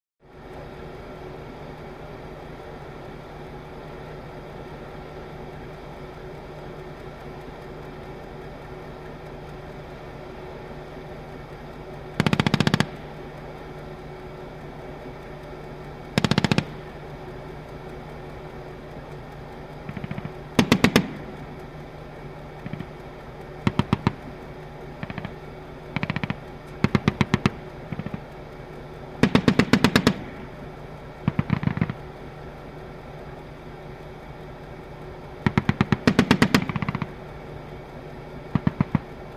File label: Tank machine gun shots